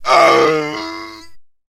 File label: man death; Mount&Blade Warband